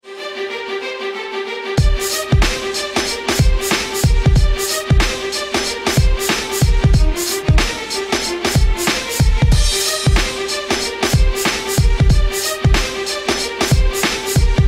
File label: aggressive motivational hard hip hop instrumental